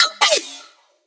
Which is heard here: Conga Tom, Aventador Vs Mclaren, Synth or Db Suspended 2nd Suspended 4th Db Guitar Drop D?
Synth